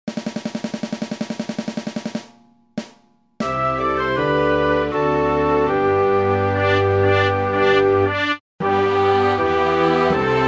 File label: orch